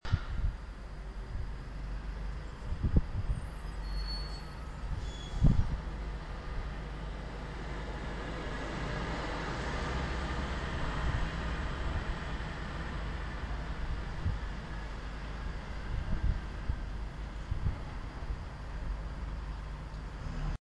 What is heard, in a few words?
street sounds, Rotherham